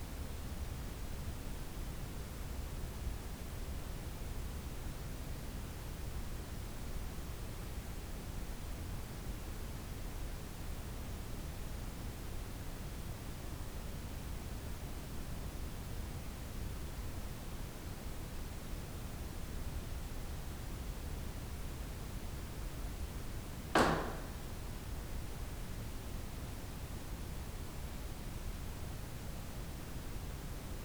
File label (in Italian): raps imponente in Sala Pietra